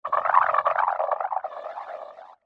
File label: Liquid Alien Magic, alien sound effect; science fiction sound effect; Andrew Fleming; Twistedtracks